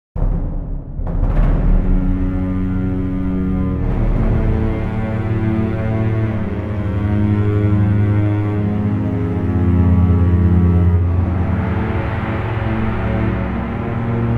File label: Ominous